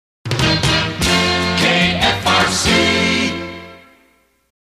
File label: With Music Mid, Jingles; KFRC